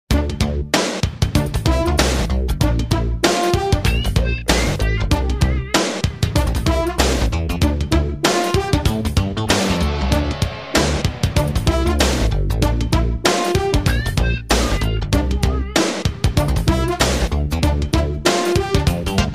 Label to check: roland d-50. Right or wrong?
Right